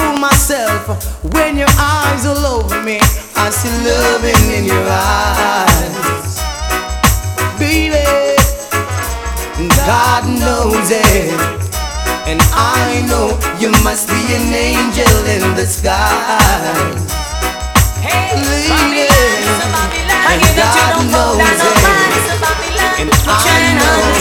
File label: 90's Reggae Mix